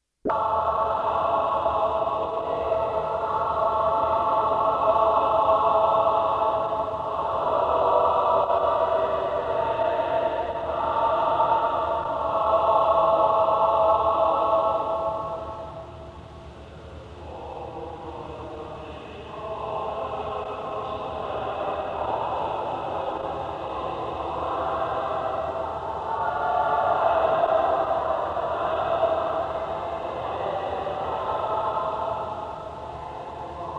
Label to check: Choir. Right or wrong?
Right